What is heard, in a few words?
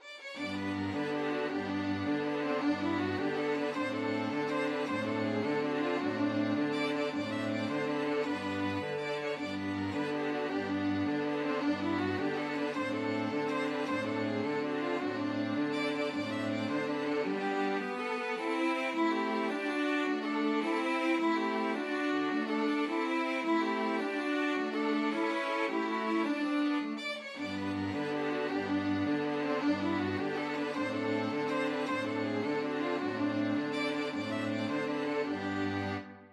string quartet